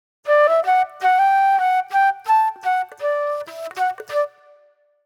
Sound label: Classic Soul Flute